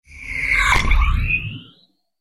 Sci Fi Weapon, Sci Fi Plasma Ray Gun Blast Impact Warble Liquid; Battle; Sci Fi Weapon Cannon Plasma Blast Liquid Science Fiction